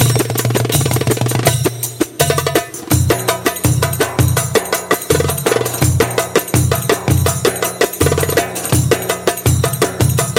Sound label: darbuka